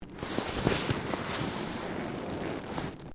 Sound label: LEATHER MOVEMENT